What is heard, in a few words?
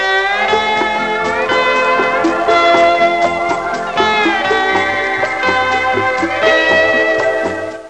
dance; My Science Fair